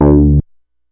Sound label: Synth oneshot Flat D, Synth Bass One Shots; Slate Digital BASSHOLE Sample Pack